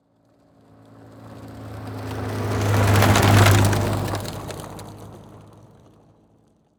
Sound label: Saturn Drive By Dirt Road; Auto; Transportation